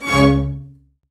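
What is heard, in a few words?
String Section; CMI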